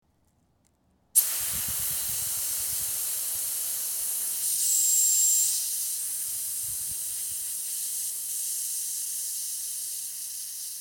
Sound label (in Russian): spusk i nakach velo kolesa